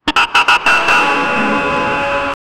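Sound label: evil laugh layered robotic; horror ambience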